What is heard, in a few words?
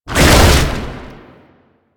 Jump, Foley; Enemies; Warframe; Proto Infested; Babau